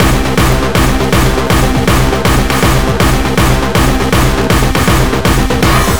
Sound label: Beats Loop; Anger Foot